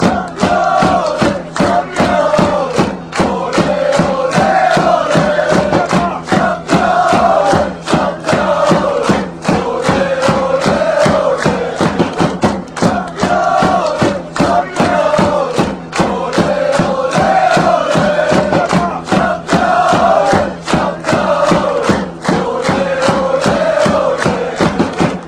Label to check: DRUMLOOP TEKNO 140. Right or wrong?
Wrong